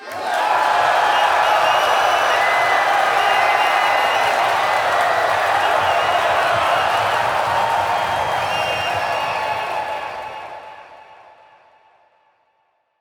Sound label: Game Start Cheer, Crowd; Lunaro